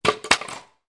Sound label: Jenga wooden blocks falling